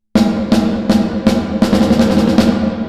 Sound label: Reverb Snare Plates; Lustrous Plates